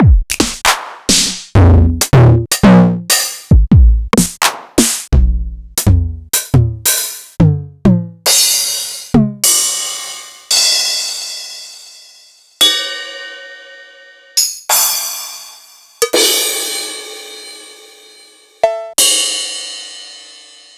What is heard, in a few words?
Yamaha RS-7000 Analog Kit